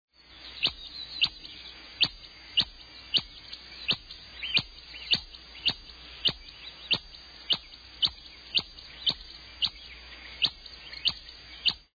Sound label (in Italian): scoiattolo